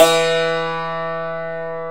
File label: Hammer Dulcimer E1; Planet Earth; Digital Sound Factory Planet Earth